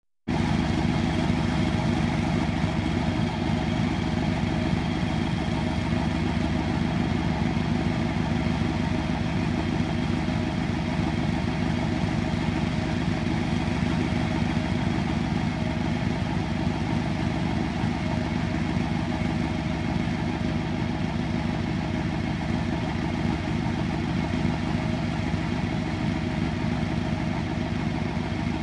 Farm Truck Engine Idle

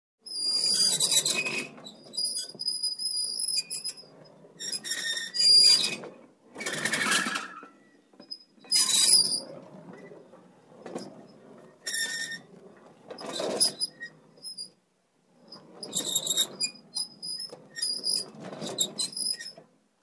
Metal wheel squeak

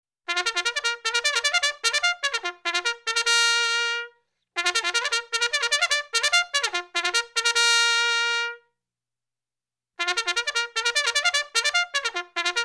Trumpet Assembly

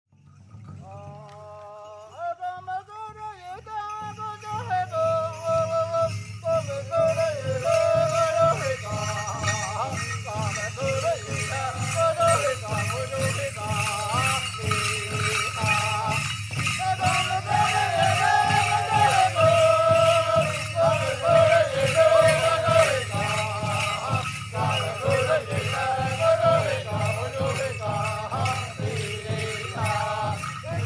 African Tribal Dance

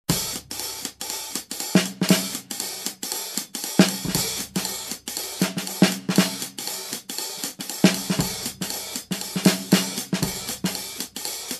Fast Jazz Drum Beat

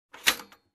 Typewriter single press

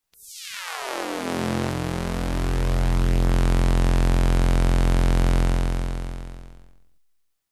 Downsweeping Bass Synth

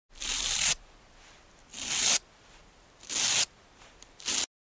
Removing Straps

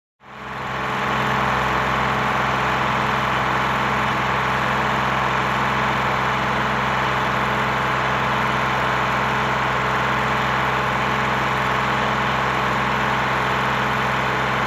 Front Loader Engine Noise

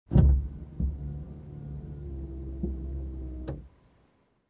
Car window down

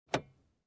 Locked Car Door Handle

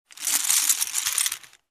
Poker Chips

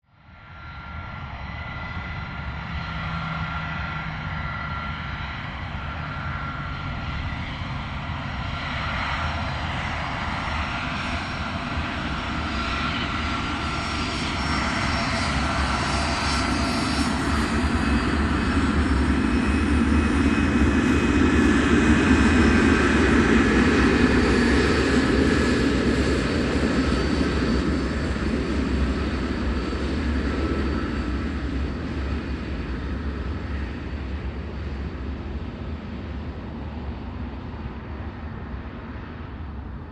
Aircraft Ground Rolling

